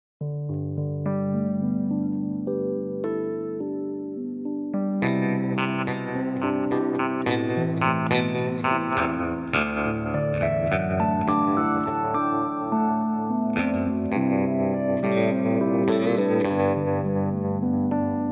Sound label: (G)